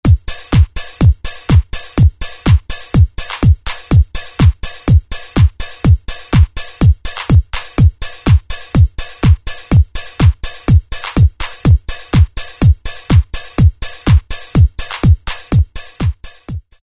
Goa Trance Drums Pattern, Roland MC-303

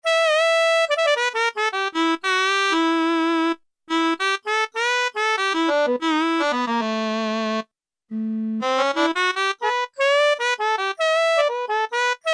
Morph Sax, E-mu Morpheus; SethySax

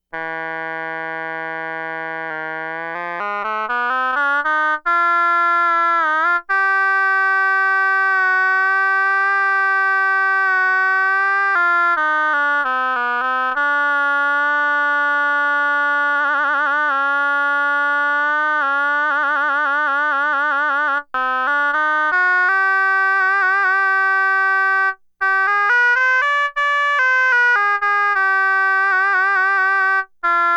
Oboe, Roland SH-2000

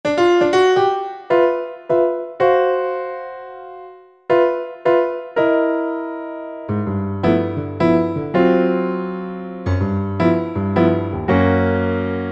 PIANOS and KEYBOARDS Grand Piano On Stage; Apple GarageBand 2

